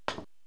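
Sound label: stroke, 9ball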